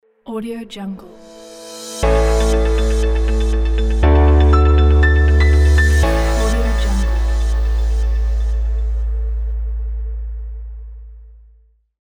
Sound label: educational logo